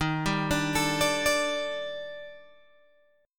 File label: Eb Major 7th Flat 5th Eb Guitar Open G